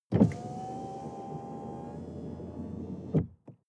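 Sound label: sports car interior window open distant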